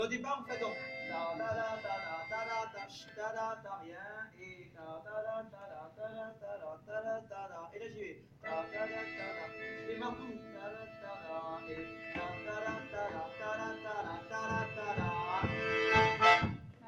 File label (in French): final cercles; Musique d-ensemble; Entre-Deux